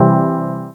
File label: chord